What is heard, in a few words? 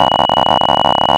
clicks